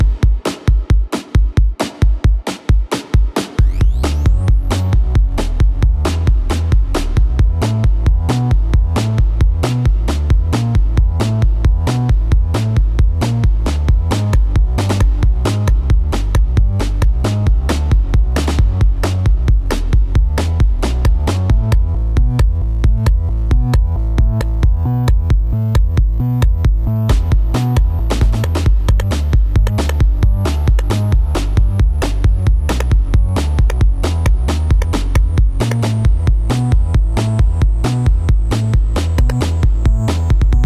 earthquake Drone; casualtiesunknown

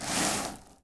splint; casualtiesunknown

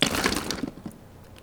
vomit; casualtiesunknown

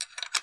gun load shell; casualtiesunknown

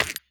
rubber; casualtiesunknown

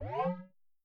mood up; casualtiesunknown

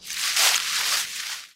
bush; casualtiesunknown

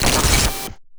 cut; casualtiesunknown

